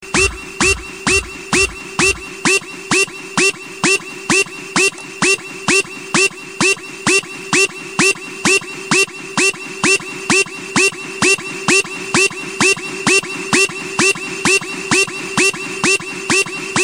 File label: Alarm Tone